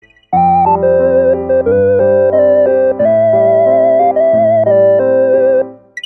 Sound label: Chord 7